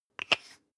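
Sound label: toothpaste close twist hard, Bathroom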